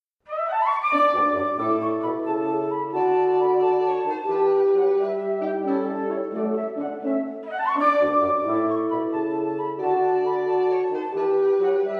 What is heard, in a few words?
Quintet; in D Minor, op 100, no.2, Mvt 3